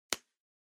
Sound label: Felt Tip Pen Open